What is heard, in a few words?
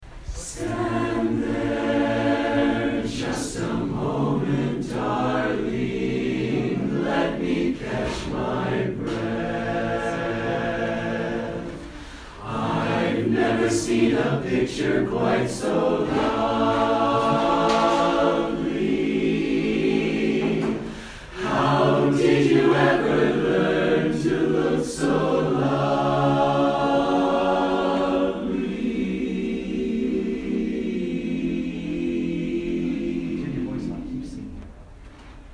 Barbershop Quartet